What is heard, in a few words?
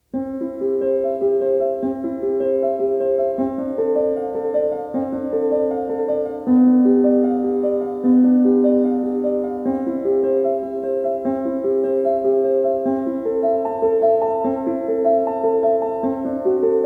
Präludium Nr. 1 in C-Dur (Prelude No. 1 in C Major)